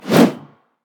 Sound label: darius basic attack